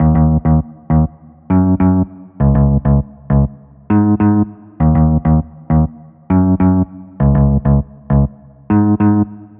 Loop 100 Hybrid Guitar Eastern D m Electronic Pop Tropical Guitar Future Afrobeat